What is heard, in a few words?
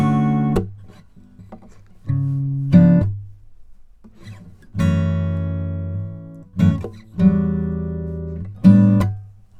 Xmass Guitar Loops 100bpm C#min; Guitars; Ghosthack; Advent Calendar 2019